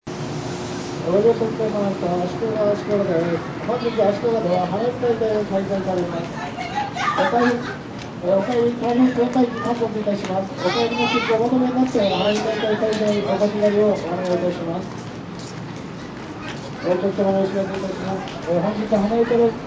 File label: bell full; ashikaga